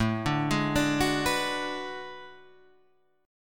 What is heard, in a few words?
B Minor 6th A; Bm6/A arpeggiated; JGuitar